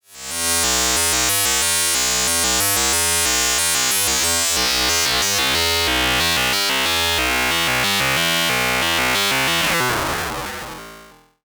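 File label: E-RM Polygogo Doepfer A 155 und Soundforce Dual LFO Loop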